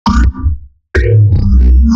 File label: cool bass